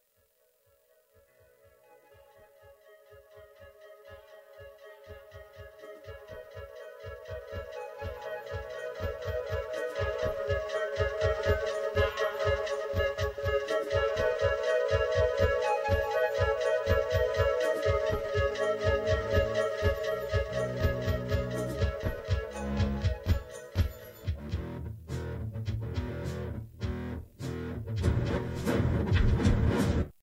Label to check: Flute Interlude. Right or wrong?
Right